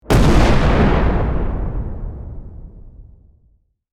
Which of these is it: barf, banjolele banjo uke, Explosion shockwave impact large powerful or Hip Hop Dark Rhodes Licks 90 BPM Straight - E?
Explosion shockwave impact large powerful